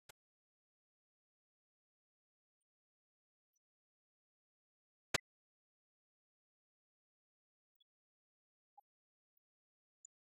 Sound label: balladi rhythm 100